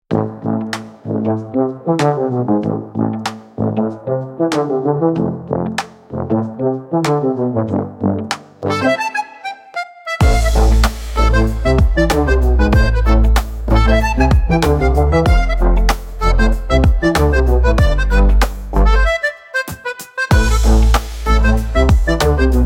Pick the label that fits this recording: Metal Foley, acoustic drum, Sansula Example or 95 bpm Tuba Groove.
95 bpm Tuba Groove